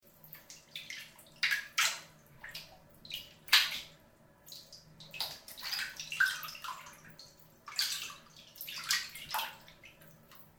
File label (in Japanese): お風呂のお湯で遊ぶ 小さいチャプチャプ音